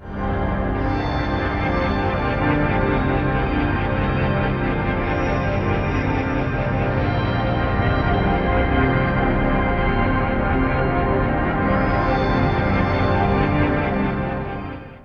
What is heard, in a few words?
AMBIENT PAD; AMG - Global Trance Mission VOL-2